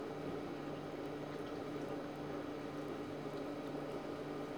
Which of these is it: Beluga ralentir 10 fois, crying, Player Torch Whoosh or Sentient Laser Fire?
Beluga ralentir 10 fois